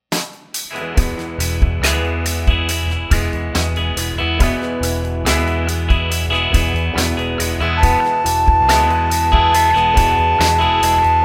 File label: scale degree